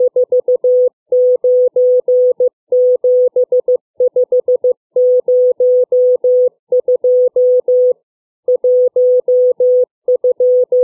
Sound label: letter number groups, CW